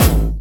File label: Jump, kicks